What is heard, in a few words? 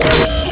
sidewinder away; KATANA; Daikatana